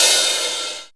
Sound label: FX Cymbals, Percussion; Dance Synths